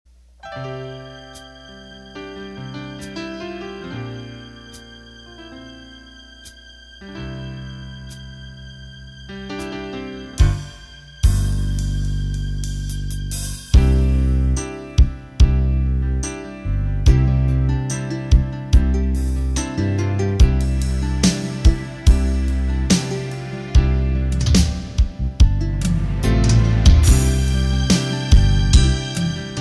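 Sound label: Beat Modern Slow, Roland accordion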